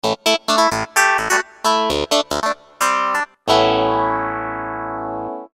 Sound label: comb; moss